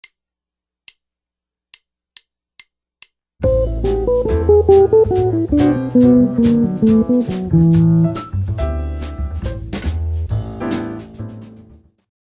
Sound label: licks